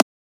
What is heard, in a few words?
Transient; FLPRO